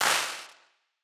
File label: Wider Clap; stereo toolkit